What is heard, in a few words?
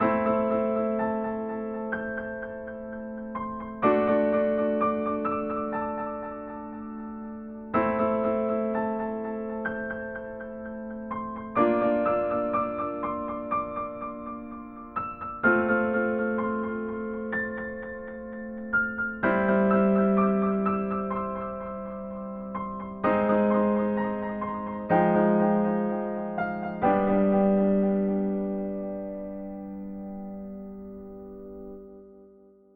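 delay piano; secret of mana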